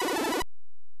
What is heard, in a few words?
alert; Bruce Lee